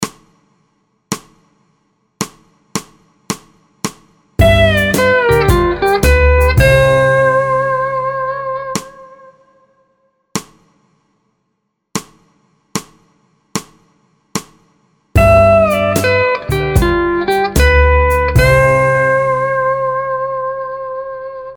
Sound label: Key C Vamp 4 4